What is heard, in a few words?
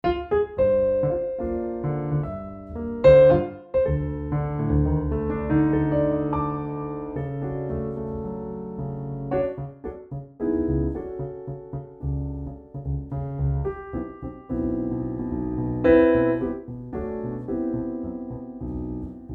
CFX, garritan cfx concert grand; Aria Player presets